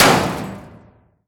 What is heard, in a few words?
bullet metal barrel